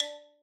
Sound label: button; sweetcandymania